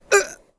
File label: pain, male; warfork